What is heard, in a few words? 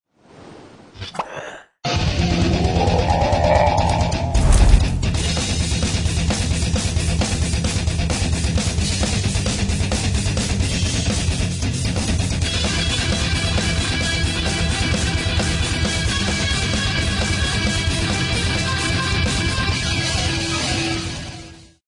cinderella metal; Fairytale Island; Poptropica